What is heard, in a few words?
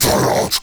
Cast Vocal; Warframe; Uriel; Remedium